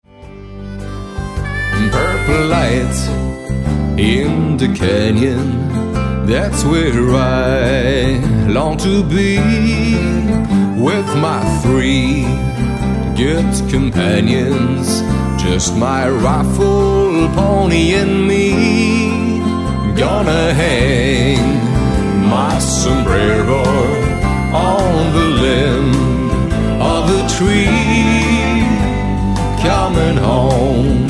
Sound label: western medley